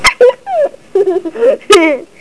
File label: laugh